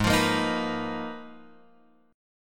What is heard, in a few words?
Ab Minor 6th Add 9th, Guitar, Open F; strummed; x,3,5,5,6,6